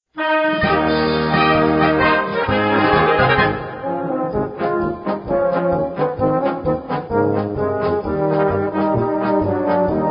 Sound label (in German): Zillertaler Tenorhorngruesse